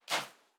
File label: Footstep Sand Walking; Character Sounds